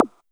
PERC; Dubstep; DRUM HITS; Loopmasters; The New Sound Of Dubstep

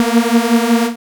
BLAST Bb2, dubstep; Jack Sparrow; Deep Dubstep Progressions

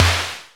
Snare, Dubstep One-Shot Drums

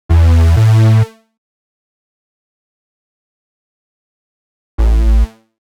128 Am Bass, Complex Electro Synths; Prime Loops; Speakerbox

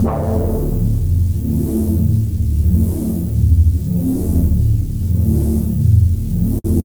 Bpm140 Chord Loop D, Dubstep Chord Loops

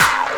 Funk Stopper; Fx; Loopmasters; Jon Carter & Alex Blanco; Block Party Essentials

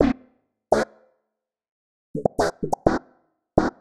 126 D MUSIC; funky; Loopmasters; Funky Underground Vol 2

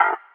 G STAB, funky

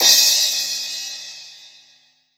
Crash, Cymbals

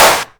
Claps & Snares

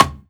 Percussive

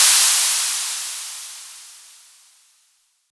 Crash, Dubstep One-Shot Drums

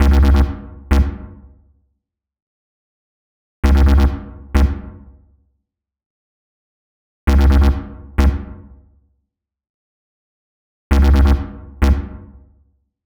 132 Em Bass, Bpm132, Complex Electro Synths; Prime Loops; Ossify

